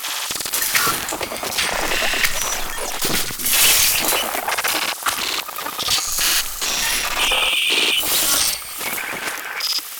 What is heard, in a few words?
Broken Computer Loop; Warframe; Quests; Entrati Labs